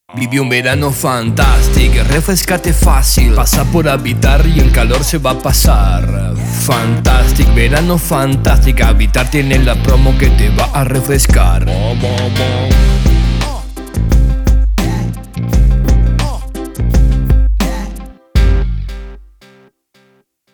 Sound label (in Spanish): Jingle Habitar Verano